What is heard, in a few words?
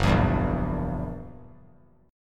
Fsharp 7th Flat 9th down, F#7b9 chord; strummed; JGuitar